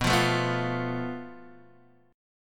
A Suspended 2nd Sharp 5th

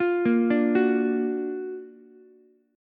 Gb Augmented Major 7th Gb down Ukulele; Gb+M7 arpeggiated; JGuitar

